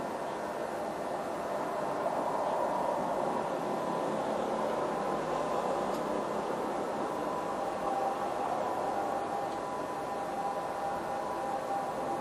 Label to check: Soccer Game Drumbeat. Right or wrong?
Wrong